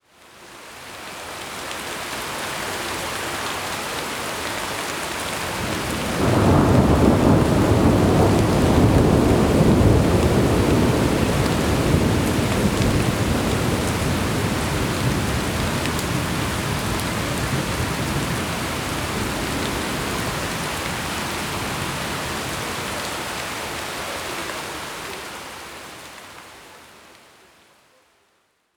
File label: City Rain Ambience; Biolateral